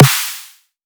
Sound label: another snare again